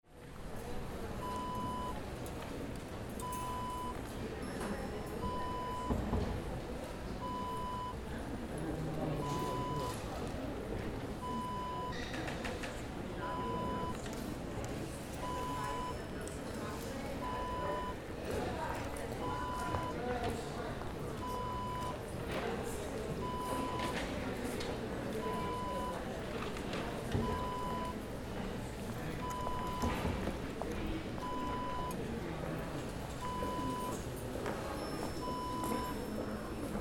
museum lobby

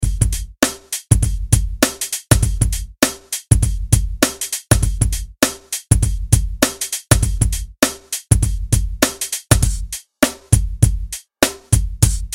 Hip Hop

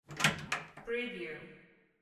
door open

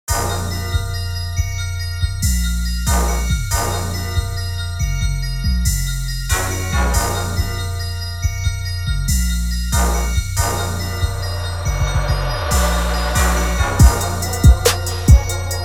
Quick Trap

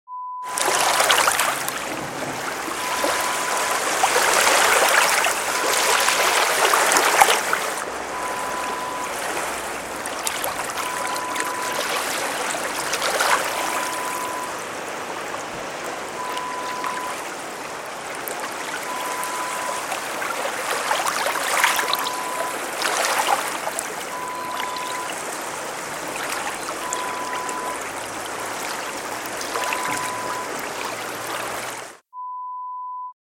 Water Lapping sounds

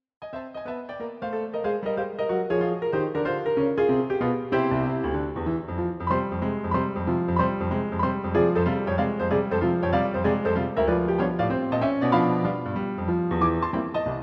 piano Roll; Confrey Zez

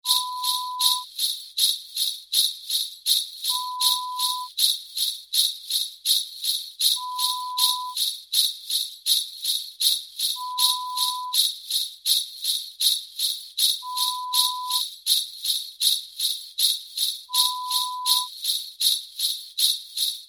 Christmas Sleigh Bells

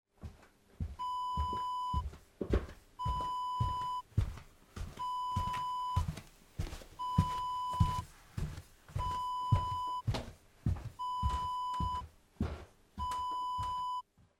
footsteps on rug

